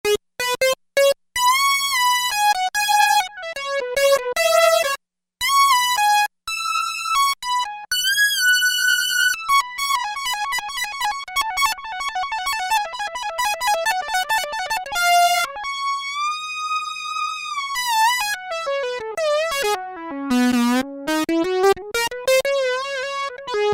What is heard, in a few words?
Synth Solo, Roland MKS-70